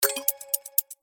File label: tick low